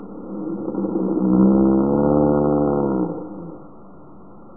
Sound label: zombie barn idle